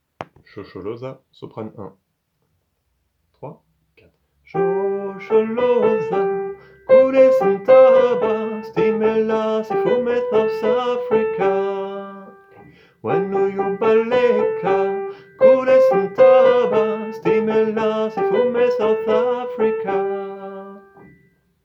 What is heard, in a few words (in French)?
shosholoza soprane; Traditionnel Sud Africain